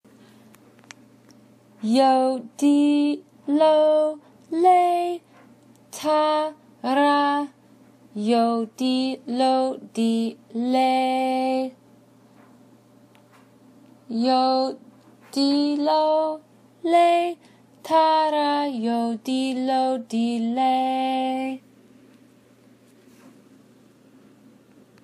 chorus

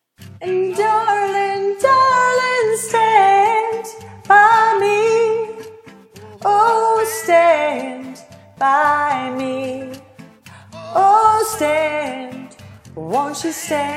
harmonies

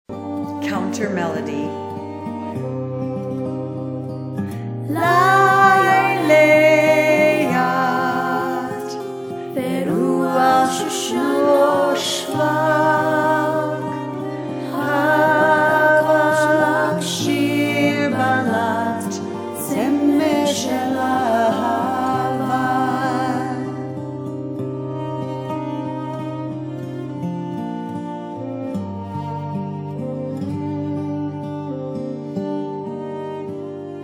choir melody

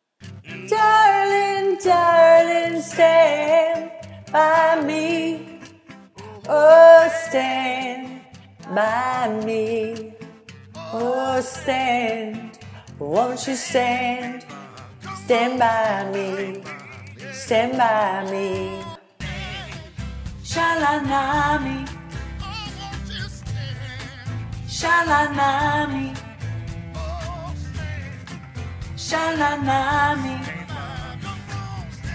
harmonies

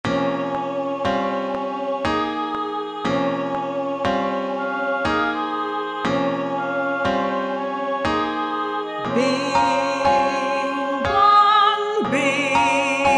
soprano

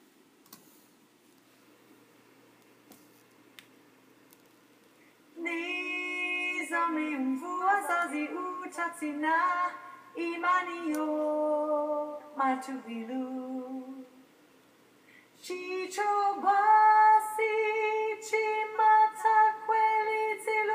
bolero soprano theme 2 coda

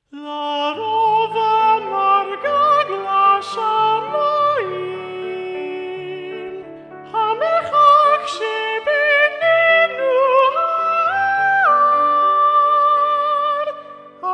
five hebrew love songs soprano predominant

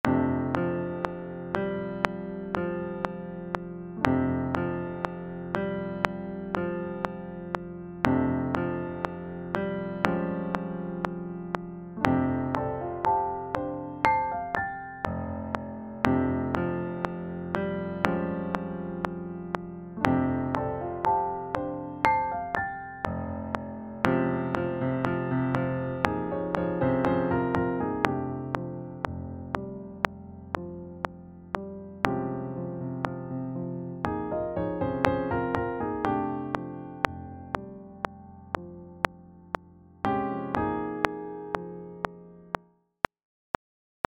piano only

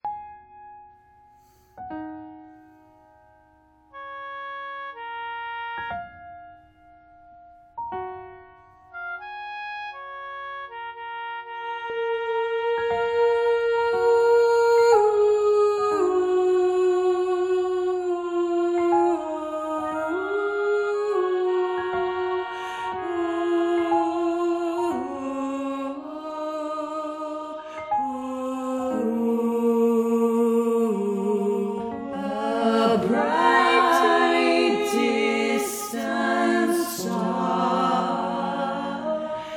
alto

